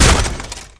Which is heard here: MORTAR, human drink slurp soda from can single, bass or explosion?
explosion